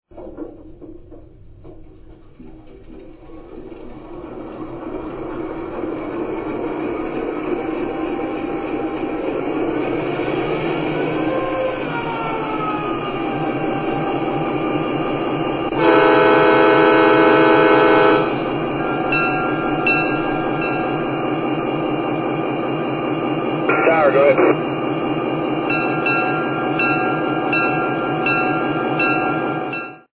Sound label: GP7